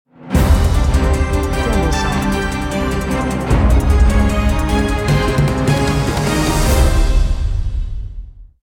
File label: Thrilling Action Stinger